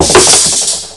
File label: CRASH; CAT2